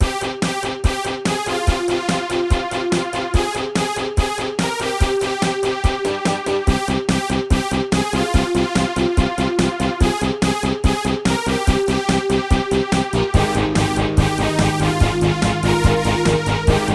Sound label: Techno Remix; Nitemare 3D